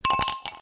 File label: ice cube in glass sms tone; sms ringtones